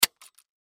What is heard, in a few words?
Stapler Shoot Staple Press; Office; Blastwave